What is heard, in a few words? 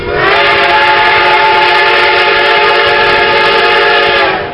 horn